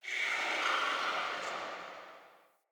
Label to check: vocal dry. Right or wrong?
Wrong